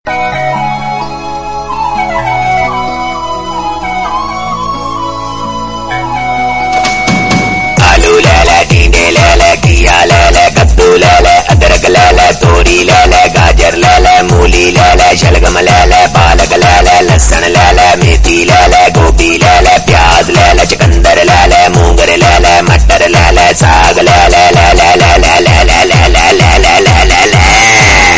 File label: ringtone; Titanic